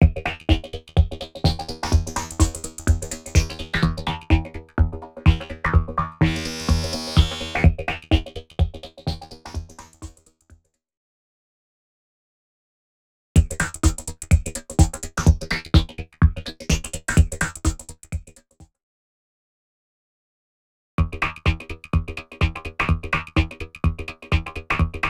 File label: LFO 126bpm; X-Static Goldmine 5